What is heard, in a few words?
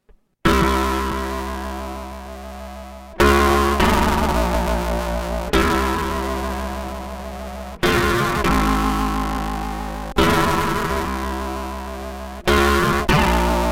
colour theory PWM